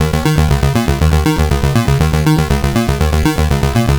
Eb 120, Synth Loops 120bpm; Acidized; Zero-G; Soundclash Nu Dance Electro

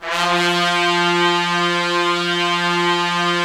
BRS TPS, Trumpets; Roland - LA Composer vol. 4

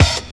KICK+OPEN; East West - Phat & Phunky

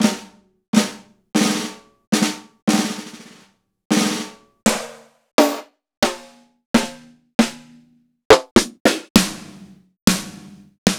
Roland BK-5 Snares

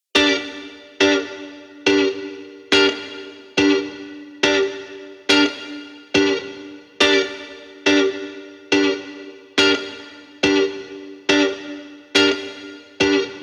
140BPM Gmin Guitar FX, Offbeat Dub Guitars; Antidote Advent

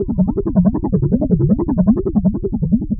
Noise, LFO & Noise

